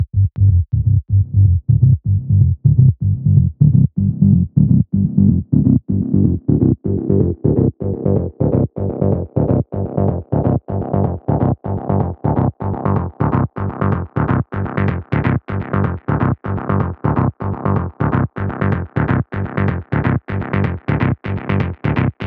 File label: Synth Bass Filter and Saturation